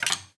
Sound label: Snare Low Attack